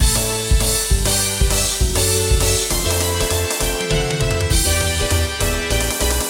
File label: Party music loop